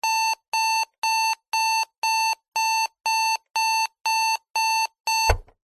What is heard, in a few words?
household alarm clock beeps then switch off snooze button